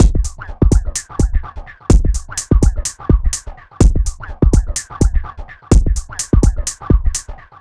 HIPPOP A R; Spectrasonics; Metamorphosis

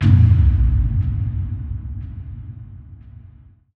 Impact; impact samples

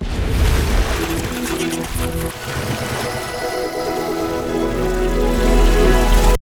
melodic fill

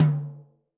Jazz Mid, Tom, Yamaha DTXpress-II

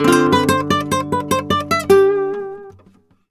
Flamenco Guitar Picado, dm; Best Service ProSamples vol.46 - Flamenco

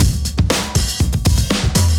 breaks, 120bpm; free bespoke breaks; BeatStyleC

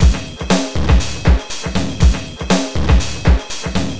Noise Beat; Drums & Drones

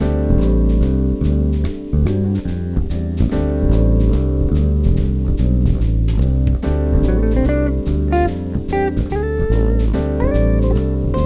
Bass Grooves; DADDY; USB Soundscan vol.30; AKAI

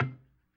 C1 PERC, B3

